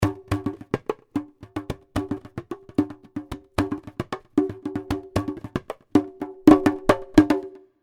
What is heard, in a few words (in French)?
son Djembe petit; Percu; Duperrex